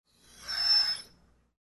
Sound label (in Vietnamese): tieng cho keu dang thuong giong tieng coi